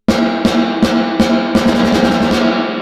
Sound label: Reverb Snare; RO-SPR